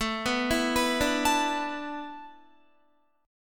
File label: A Suspended 2nd Suspended 4th; Asus2sus4; arpeggiated; 17,14,14,16,14,16; Guitar